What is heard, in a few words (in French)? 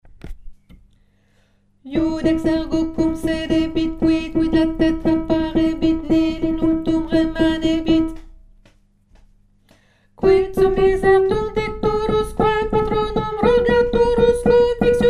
dies irae lettre I alto